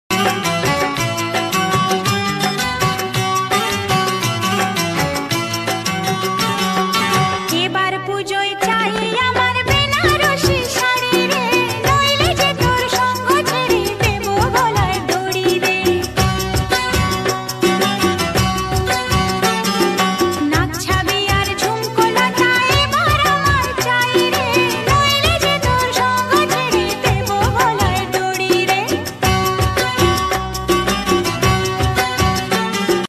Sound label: emotional ringtones bangla ringtone; koster gan